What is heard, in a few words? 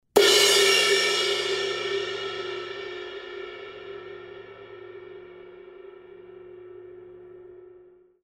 K Con Med Ride Crash, cymbal